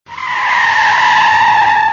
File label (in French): auto crissement pneu